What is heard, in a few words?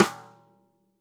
stickshot - snares on; JazzFunkKit